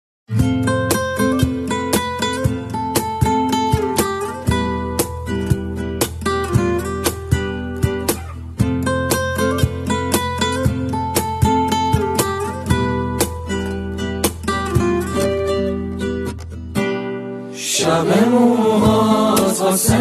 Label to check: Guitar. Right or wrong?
Right